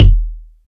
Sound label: loud kick single hit d sharp key; kick drum samples